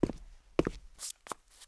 konec krok; Mafie 1

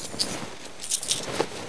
hleda mince; Tom hleda mince; Mafie 1 cz

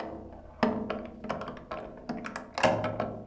trezor odemceni; Mafie 1

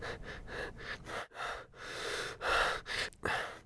funeni; Mafie 1

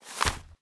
noviny; Mafie 1

